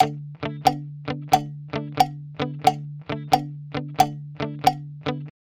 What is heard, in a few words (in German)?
gitarre comping shuffle richtig gesetzt